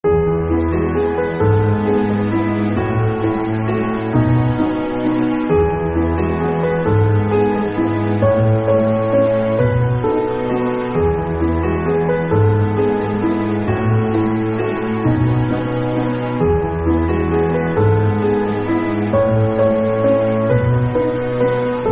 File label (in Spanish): Música de Fondo Sentimental para Videos, Música Instrumental de Fondo